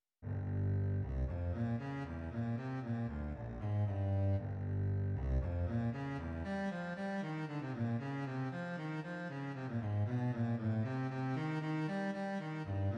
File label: suite for contrabass allegretto